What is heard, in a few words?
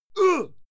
male fight yell short